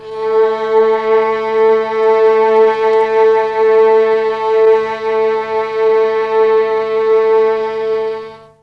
A3 300 VIOLA, Mellotron, STRINGS; Mike Pinder - Mellotron Archive CD

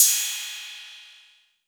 Roland 808 CY; Drums; Tr-808

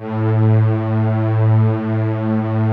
Prophet 5 A3; Hollywood Edge - Giorgio Moroder Rare Synthesizer Collection

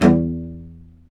STR, Vc Marcato; String Master Series; Vc Marc&Harm; Vc Marcato 1

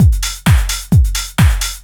drum 130 trance

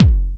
BD, Dance Mega Drums, BD HOU; Best Service

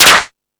Rap Session, E-MU Pro-Cussion

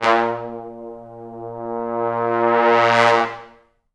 A#1, Pop Brass; Best Service ProSamples vol.19; AKAI

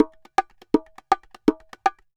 Bongo Poly 120, Drums & Percussion; Best Service; Festa Latina VOL-1

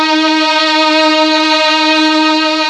Mellotron D#3, STRING; Best Service Gigapack 1

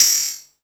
perc, Drum & Bass, 160 BPM; Ueberschall; Drum & Bass Flash Trackz

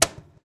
CAJON; Univers Sons - Raricussions

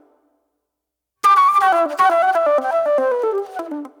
Spit Plate Flute

